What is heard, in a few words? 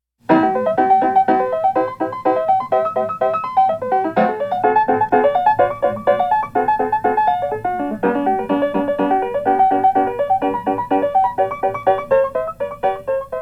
Piano Sonata no. 3 in C Major, Allegro con brio